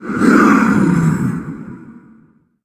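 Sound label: attack vox long; gundyr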